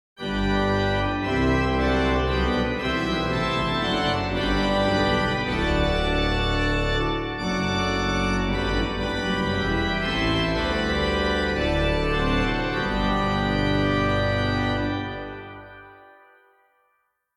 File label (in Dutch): begeleiding huidig